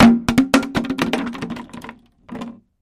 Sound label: Plastic Gallon Jugs